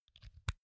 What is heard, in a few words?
insert mini jack phone quick, elektronik; objekte